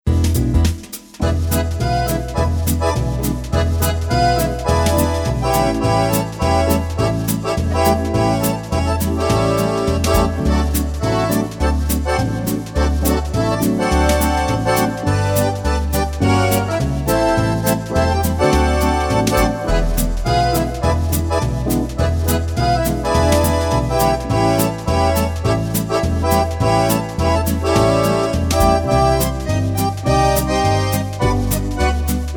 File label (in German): Akkordion Swing; Wersi